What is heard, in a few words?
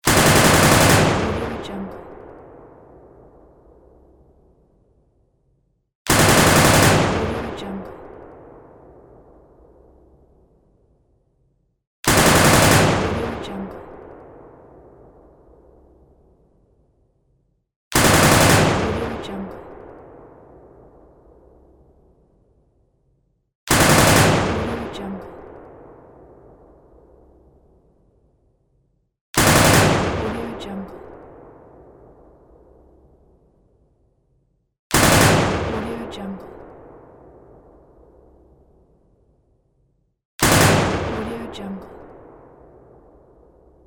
machine gun